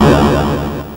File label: Atari Noise Long, Atari 2600